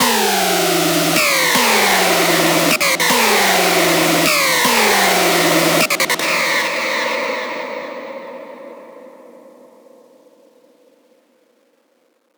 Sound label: screech loop E min 155; Music Loops; GhostHack